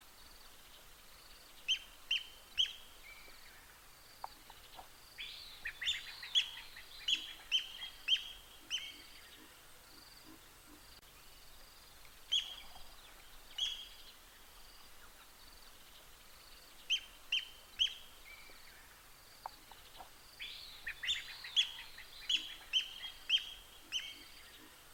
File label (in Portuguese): Canto do barbilhao de gola branca